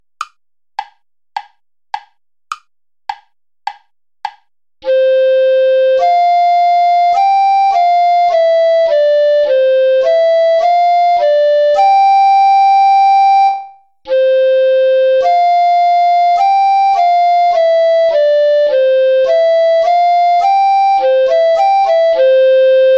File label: vivace play along